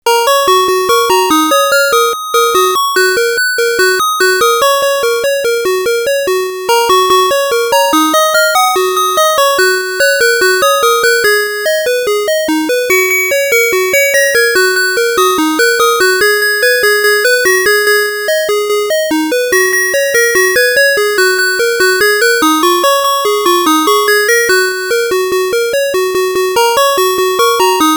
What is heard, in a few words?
Chord B